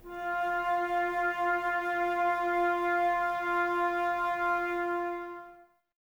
Flute, Woodwind

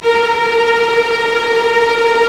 STR SEC, Roland LA Composer STR ORC FX; Roland - LA Composer vol. 1

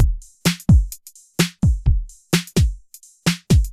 Bonus Beat, Drums

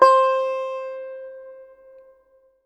SITAR C6; Spectrasonics; Heart of Asia

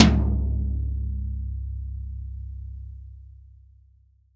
TOM, Real Drum Kit, SWING KIT

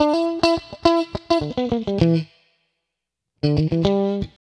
Electric Guitar

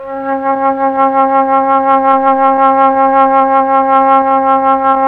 FLT, Melotron Flt, Tron Flutes; Roland LCDP09 Keys of the 60s and 70s 1

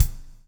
BLUES TK, Backbeat; Spectrasonics - Backbeat

